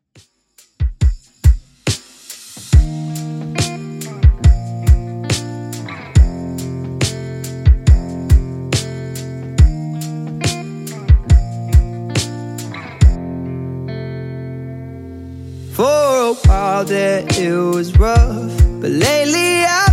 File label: Top40 Redrum 70bpm